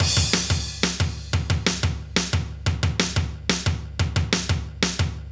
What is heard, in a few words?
Kick Snare Loop; Construction Kit; Drum & Bass